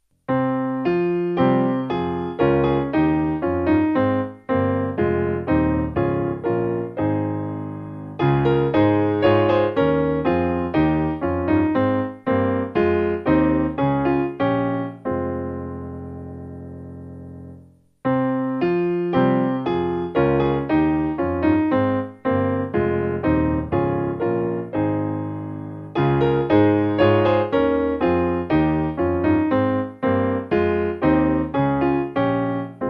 My Soul Gives Glory, Morning Song, Piano

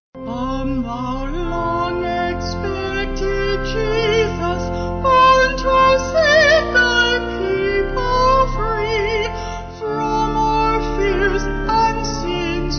Come Thou Long Stuttgart Vocals Organ

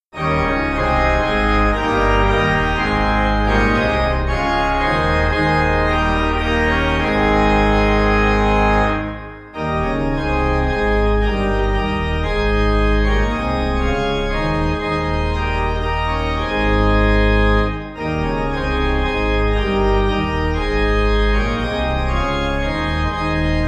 Sing Hallelujah Pipe

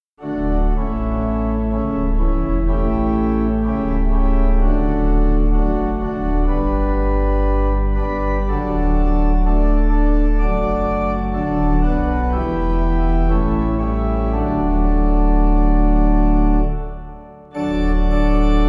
O Come Little, Schulz, Pipe

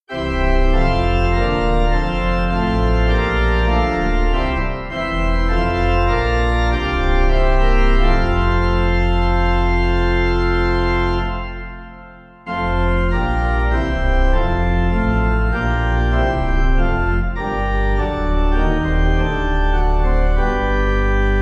Hark The Glad Sound Bristol Pipe

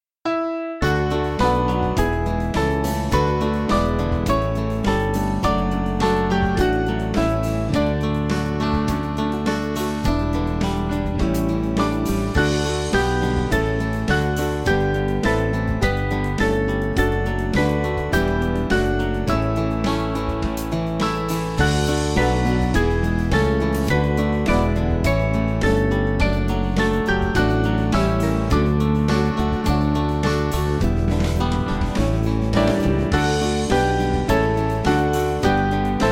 We Praise You Lord, Band

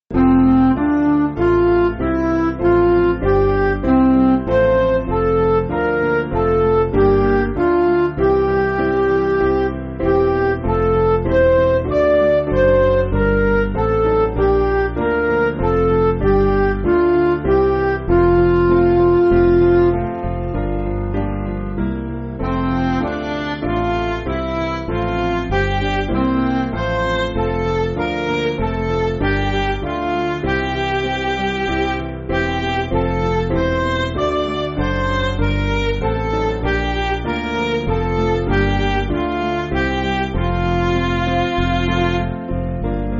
Midi

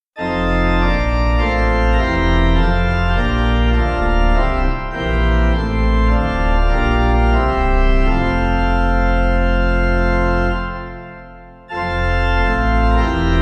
From Thee All Skill Albano Pipe

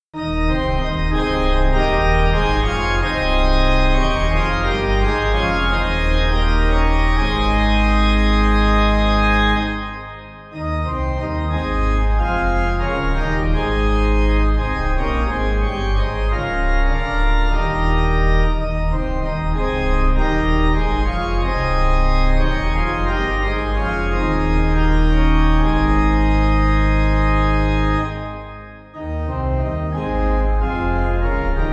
The Lord Is King, Niagara, Pipe

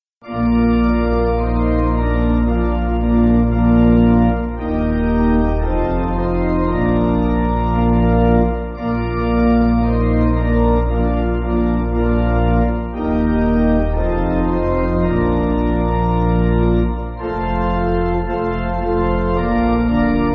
Pipe, church music

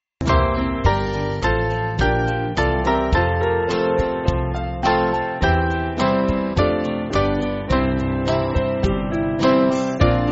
Band, church music

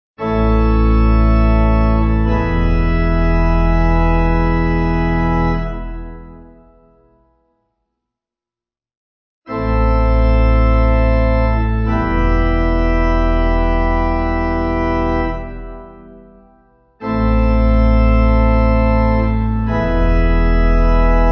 Amens Simple E Pipe

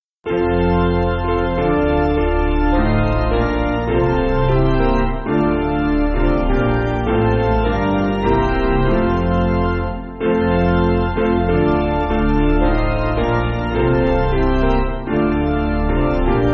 God Open The Door Piano; Staatham

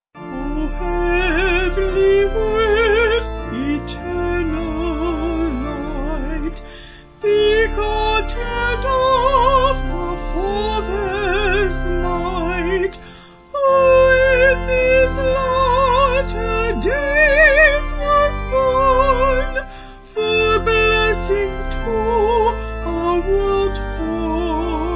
O Heavenly Word O Heiland Reiss Vocal Organ

